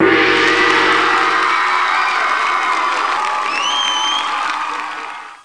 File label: skip Right; Word Wizard Games